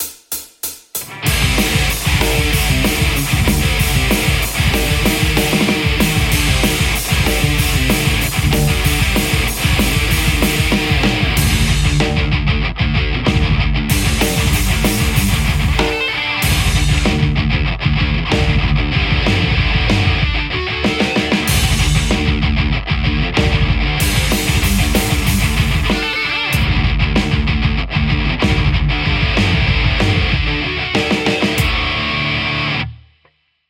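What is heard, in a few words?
metal Telecaster; kuassa creme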